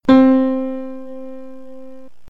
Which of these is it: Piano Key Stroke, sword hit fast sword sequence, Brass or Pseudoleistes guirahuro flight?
Piano Key Stroke